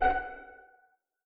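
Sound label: string pizzicato F#4 pianissimo left; Sound On Sound - Peter Siedleczek's Orchestra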